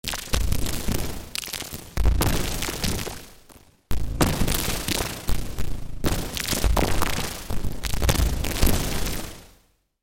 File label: Bleeding Organ, Sylenth1; Routed Sound; Sylenth1 Expansion - Doom Carpenter Vol.1